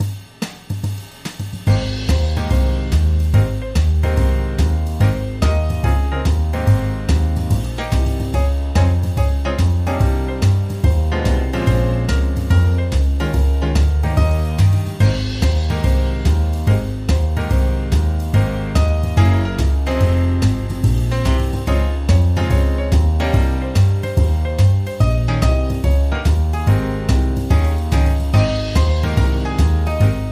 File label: Jingle Bells; Trad (PD)